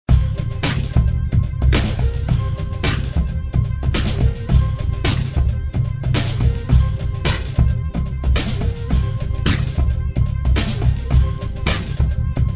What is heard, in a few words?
groove